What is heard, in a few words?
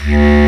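Bass Clar p D1; Digital Sound Factory Virtuoso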